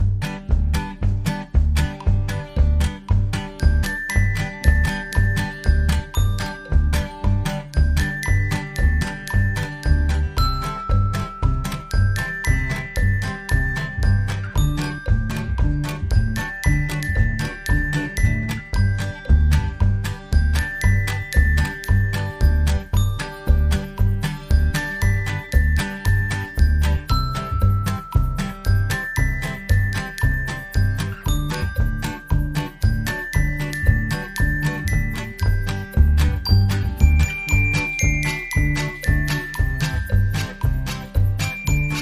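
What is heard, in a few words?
Whistle Stop Fun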